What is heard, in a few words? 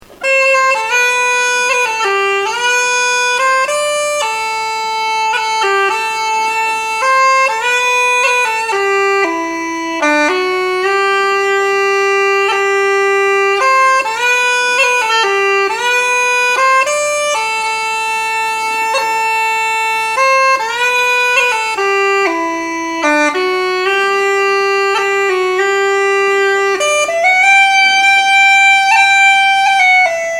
pipes